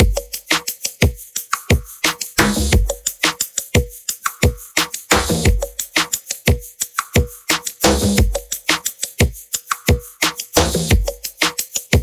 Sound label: perc loop 88 bpm